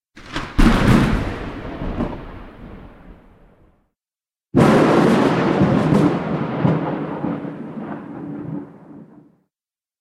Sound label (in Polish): burza